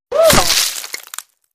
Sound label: Bullet Impacts Wood I